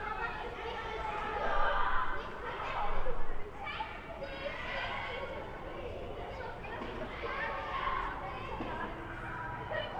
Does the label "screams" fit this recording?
Yes